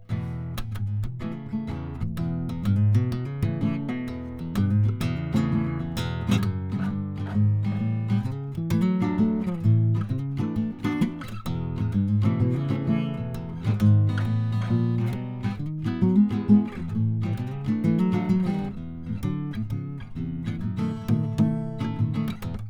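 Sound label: Guitar Acoustic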